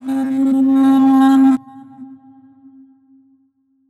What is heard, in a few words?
Alaap, Vocals Female; Sonic Foundry (Sony Creative Software); World Pop